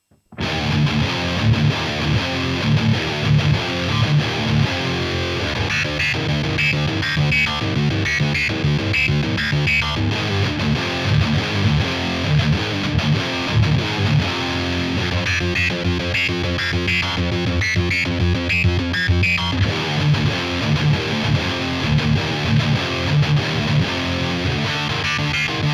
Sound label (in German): Slicer Beispiel